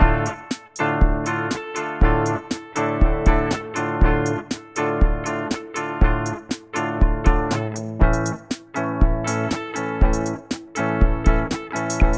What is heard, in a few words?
beat repeat bass